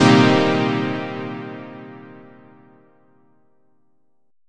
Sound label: stab satisfying, Melodic Stabs and Hits